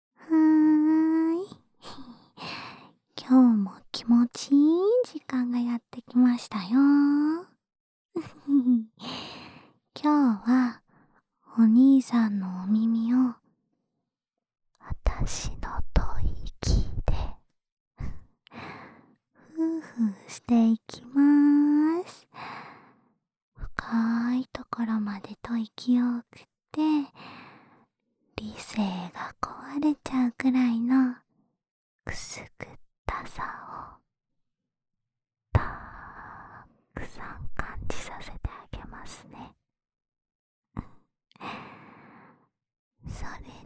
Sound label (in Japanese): 安眠用お耳ふーふーループ（右耳）, ASMR; 一週間、おまんこ係 コンプリートパック